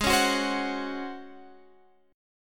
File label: Db Major 7th Suspended 4th Ab